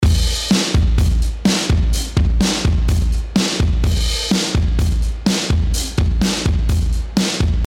DRUMS vintage

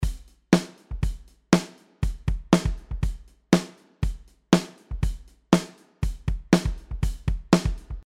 Drums Dry

